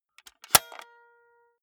walkman close clink hard

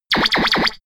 triple laser shot

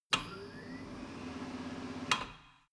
tire changing machine turning platform

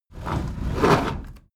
window roller shutter close soft single pull

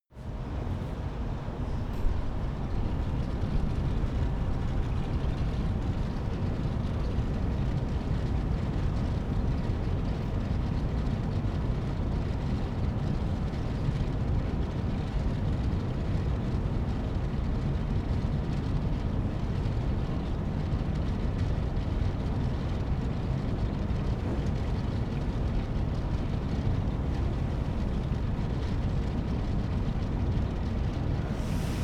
office ventilator

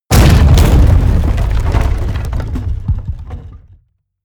break wood large

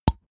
snap cheek single

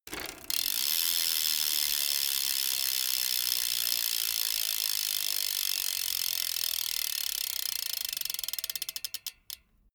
bmx bike pedal once coast

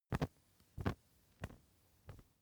match shake

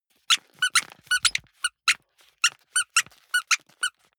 squeaker squeeze sequence hard

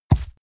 horse step grass

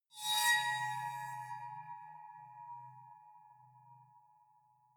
aquaphone fast

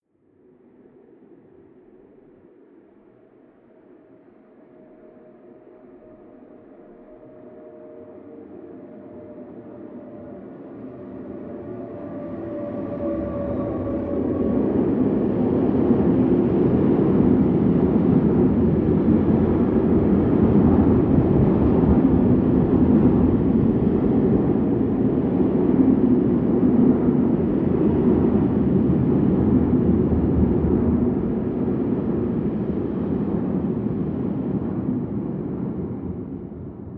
train freight drive by distant

